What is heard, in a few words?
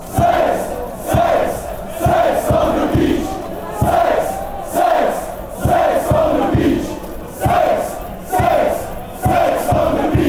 cocktail drink song, fan chants